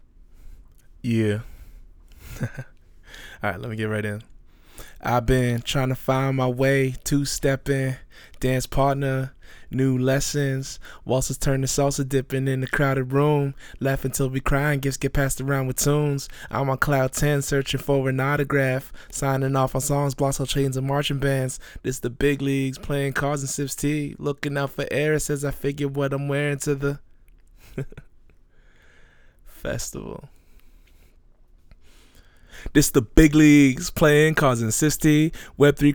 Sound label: vox